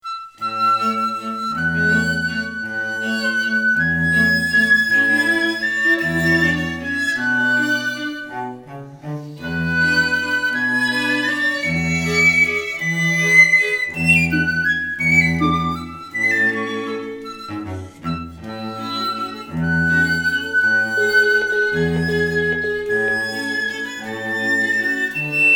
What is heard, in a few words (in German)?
Klingelton Piccolo